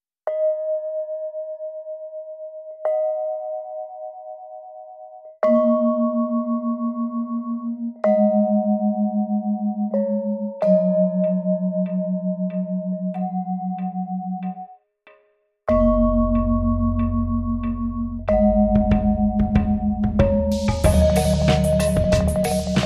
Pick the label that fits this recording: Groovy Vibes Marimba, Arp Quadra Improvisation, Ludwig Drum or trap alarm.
Groovy Vibes Marimba